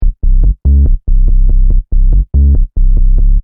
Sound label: Techno Trance Sub Bass Loop In C 142 Bpm; Bass Loops; Lynne Music